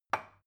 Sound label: glass put down cupboard soft